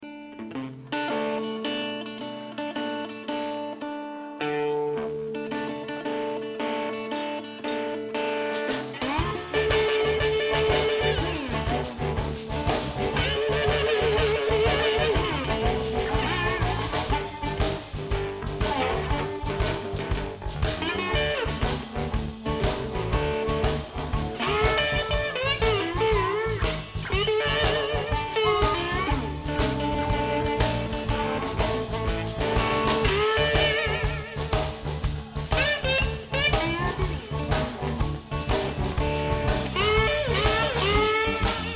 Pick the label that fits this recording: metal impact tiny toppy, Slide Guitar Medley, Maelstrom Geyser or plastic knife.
Slide Guitar Medley